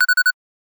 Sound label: Beep, Clean, Pure, Simple, High, x4, Fast